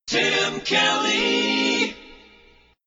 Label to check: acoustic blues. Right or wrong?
Wrong